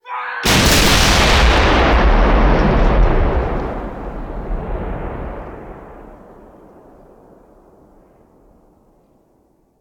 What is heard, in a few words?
Artillery Cannon Artillery; Sound Ideas; Guns